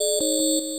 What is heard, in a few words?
bip; sven; sobrobo